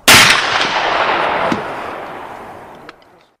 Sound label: real gun sounds wild west rifles gunshot; ASMR